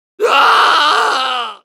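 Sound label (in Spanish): Soldier pain critical death, es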